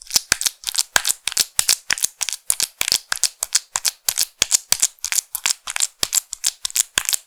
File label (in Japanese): パンパン ループ, ピストン運動 激しめ